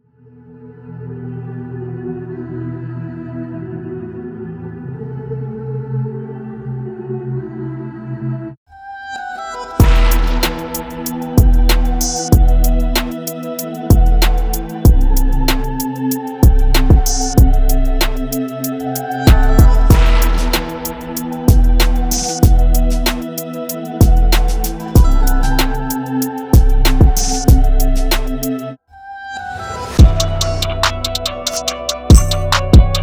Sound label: Deutschrap Drumkit